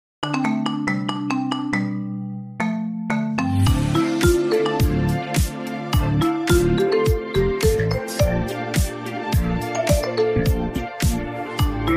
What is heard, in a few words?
Marimba